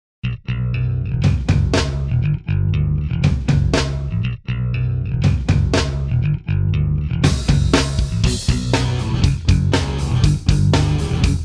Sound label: Pop Punk Instr